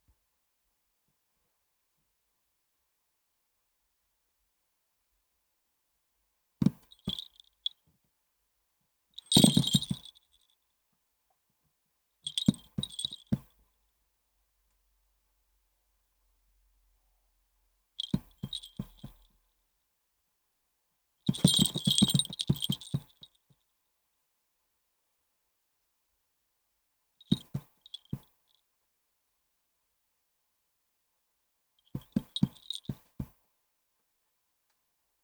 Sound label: Dog; PrinceOfGlory; OracleStudio